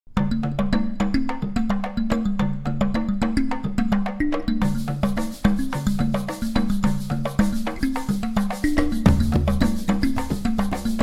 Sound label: Nigerian Beat